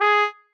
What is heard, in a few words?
marimba trombone; ゆめ2っき